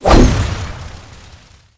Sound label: spell dash; fighter